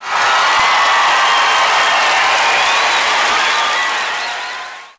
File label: loud cheering; gameplay intro